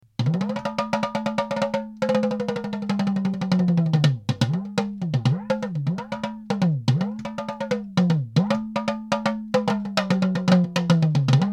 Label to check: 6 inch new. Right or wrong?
Right